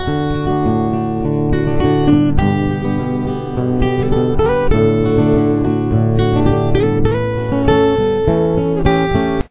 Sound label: guitar